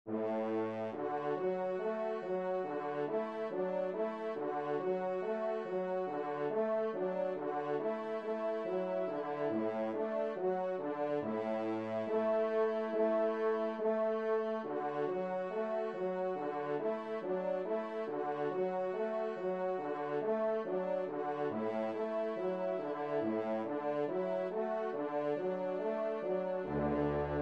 TRP; Vert Galant

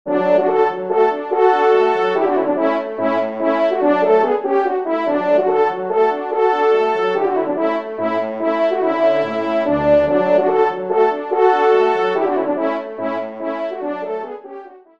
Trio Cors; Jean-Joseph Kenn (1757-1840); Trio de Cors ou de Trompes de chasse

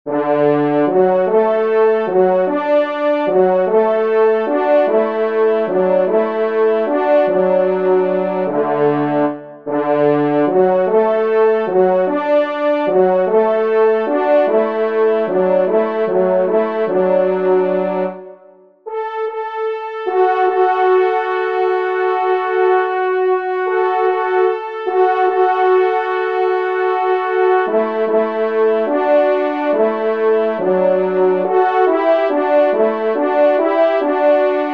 Variation Pour Trois Trompes; 2e Trompe; Gustave Rochard

